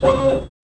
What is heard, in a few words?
mech servo; Sid Meier's Civilization 4 Complete; Beyond the Sword; Units